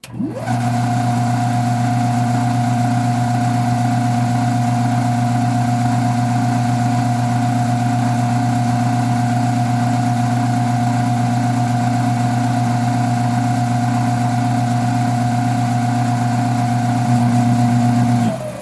Small Motor Under Stress, Cu Perspective, On Idle Off, W Whine Down.